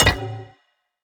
upgrade arcane Teleporter trigger OUT

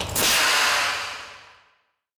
Rocket Launt

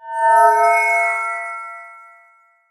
unstable crystal healing

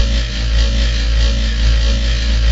wild magus doom loop

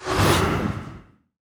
spawn unit; tower; grim wraiths

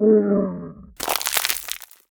Enemy Death

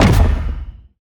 heroes spreading Burst impact; bonehart

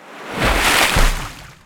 crystal serpent submerge

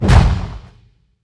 inferno boss stomp

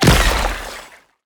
sfx spiders heroe global spawn

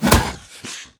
melee; wukong bdk

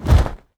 transform; raggified

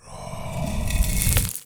coffin; sandwraith

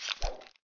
Notification Close

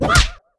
Hero Monk Counter

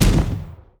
deathwood ranged attack impact; sfx

